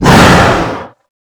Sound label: dragon shout short